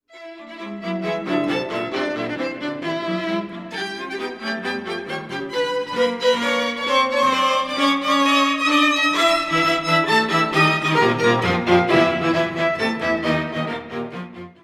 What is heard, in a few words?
Horse Racing